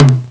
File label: perc dry tom, Amiga Soundtracker; ST-XX Amiga Soundtracker Sample Packs